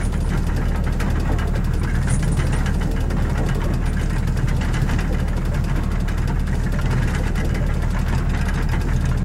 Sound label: gears turning loop